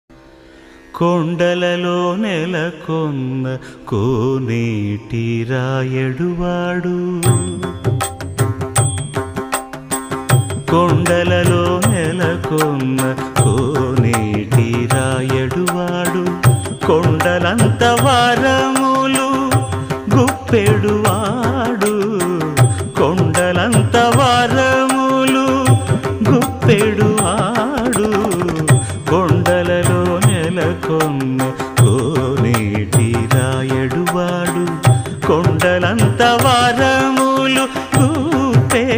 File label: telugu devotional song